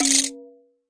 Processed Ethn Perc, ETHNIC and WORLD PERCUSSION; Doru Malaia Percussion

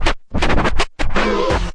turntable oh; Scratching and Turntablism; DRUM LOOPS and BREAKS

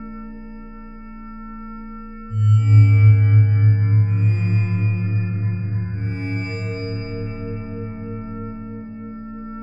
floating harmonics; LOOPING AMBIENCE

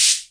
cym, Hammond Drum Machine; DRUM MACHINES

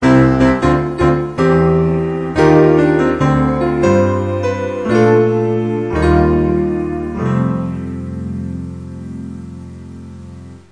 piano, vaporwave; Instant Vaporwave 80's Party

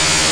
lofi Sega sample; LO FI and 8 BIT KITS; Lofi Sega Samples

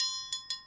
177 triangle groove, drum loops and breaks

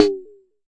Jomox MBrane, drum machines; Jomox MBrane 11

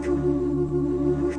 strange noisy oooh loop; Vocal

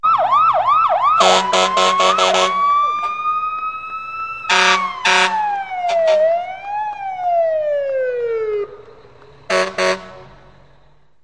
fire truck sirens horns; Sound FX Real Life

